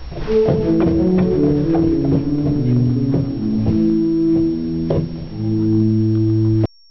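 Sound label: Oktavbass, organ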